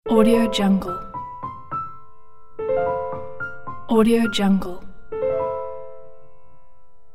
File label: sad piano logo